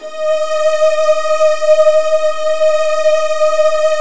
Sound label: D#2, Virtuoso Strings; ILIO; Kirk Hunter Virtuoso Strings VOL-1